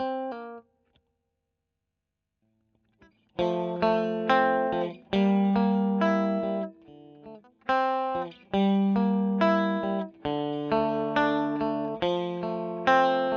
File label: E. GTR Royer